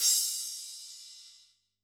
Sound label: Crash, drum